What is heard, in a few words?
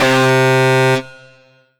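Yamaha SY22 Distorted Guitar C3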